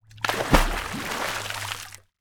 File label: Water; TOOLKIT vol.2